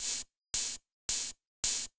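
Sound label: 110 hi hat fx, Ragga & Dub Reggae; USB Soundscan vol.27; AKAI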